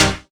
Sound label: Synths Root C